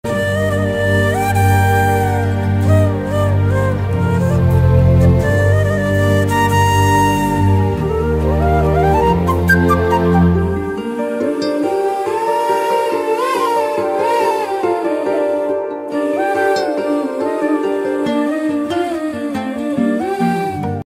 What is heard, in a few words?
flute bgm ringtone; maha samudram